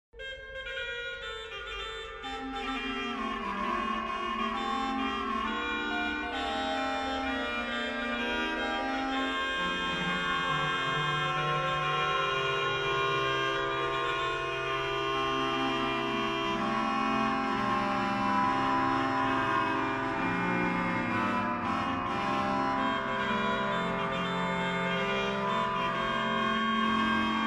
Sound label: baroque organ